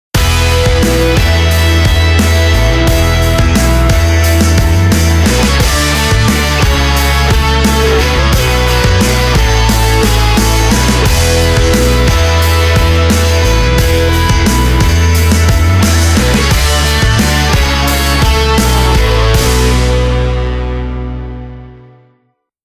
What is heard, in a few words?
Nokia Tones; Windows Phone apps; Construction